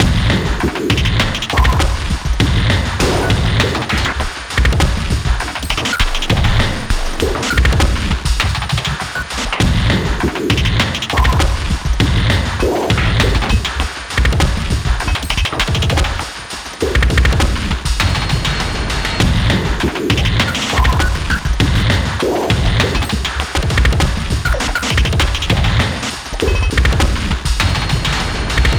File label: Small Fight; sky break